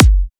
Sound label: kick housey